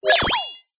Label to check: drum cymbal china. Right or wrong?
Wrong